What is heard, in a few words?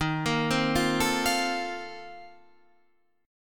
A sharp Major 7th Suspended 2nd Suspended 4th